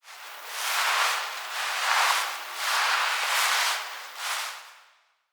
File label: Cloth, Foley; Ballas; Warframe; Cinematics; Apostasy